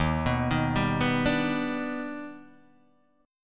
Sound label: D sharp Augmented Major 7th D sharp Guitar DADGAD